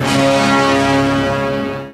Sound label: Synths C; Vengeance Samples